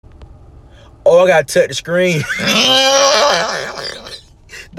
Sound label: funny horse laugh